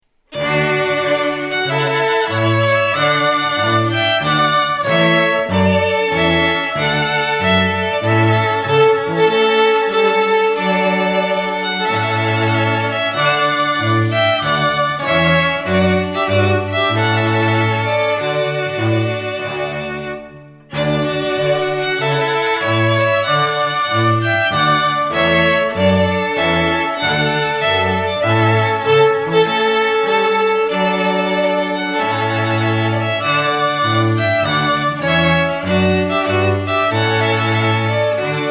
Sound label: Trumpet Voluntary